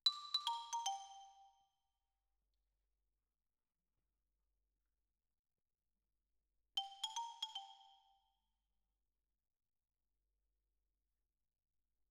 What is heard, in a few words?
Xylo